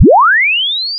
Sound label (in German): ton; akustik; physik